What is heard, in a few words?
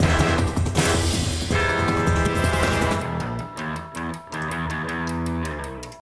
Action END